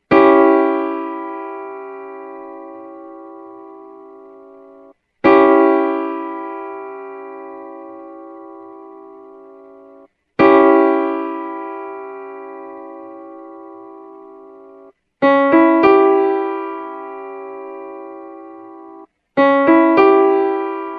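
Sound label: C sharp dim Piano Chord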